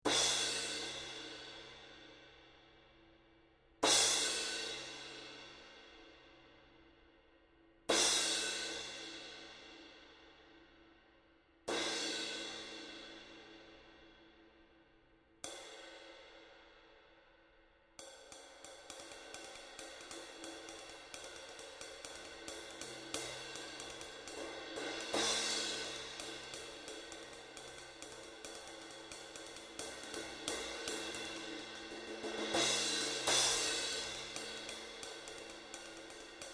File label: 18" Master Vintage Crash, Bosphorus; cymbal; 1378g